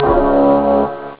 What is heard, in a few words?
horn